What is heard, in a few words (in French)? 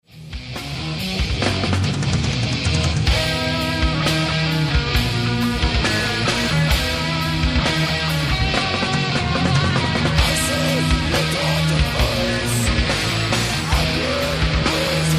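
guitare électrique riff solo; solo sur un de mes riffs, le seul morceau lent de la démo; improvisation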